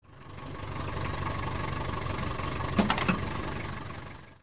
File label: taxi